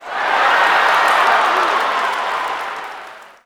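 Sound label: Basketball Crowd Cheer; SFX Kit; Crowds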